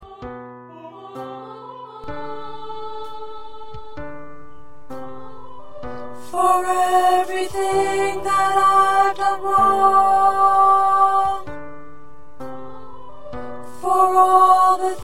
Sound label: Tenor